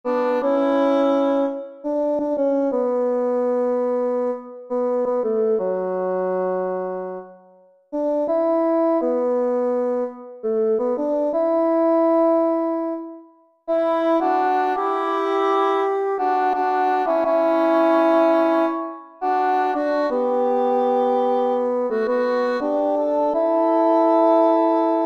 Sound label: alto and tenor verse two; Turn Your Eyes Upon Jesus